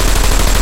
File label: loop vz37 origin; guns tanks